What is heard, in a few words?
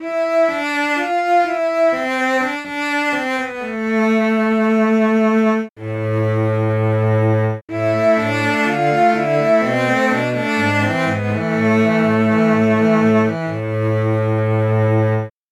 cello